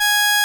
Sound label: SOLINA, String Machines